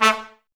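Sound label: BRS trumpets A; 90 s Sample CDs; Miroslav Vitous; Woodwind Brass Ensemble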